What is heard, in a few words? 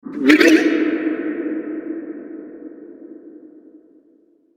Mechanical Frog In The Well, robot sound effect; fantasy; role playing games; Andrew Fleming